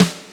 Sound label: Snare G